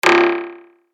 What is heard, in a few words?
PERC F Comply, percussion; revealed percussion vol 2